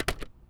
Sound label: Plastic Case; BB Retail Therapy Sample Pack